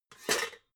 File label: pots clank soft